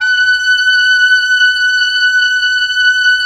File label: WND OB F, Oboe f; Roland - LA Composer vol. 4